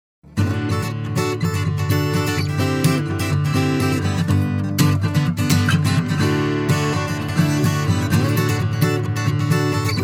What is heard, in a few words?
Guitar